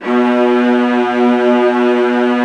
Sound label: VIOLAS C#3, Strings; ILIO; Synclavier Strings